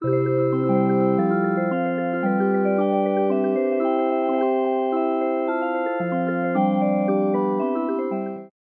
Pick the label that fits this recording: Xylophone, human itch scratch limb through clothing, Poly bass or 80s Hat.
Xylophone